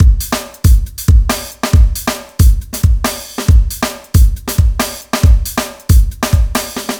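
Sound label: funky 126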